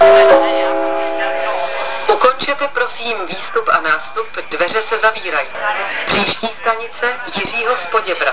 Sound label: metro